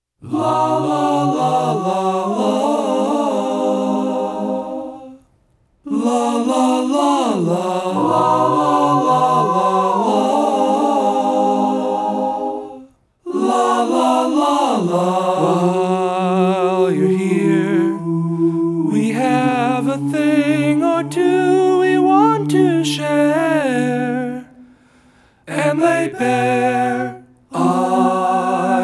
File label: Siren Song